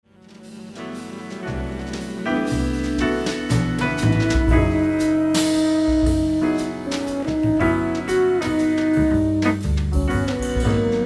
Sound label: ballade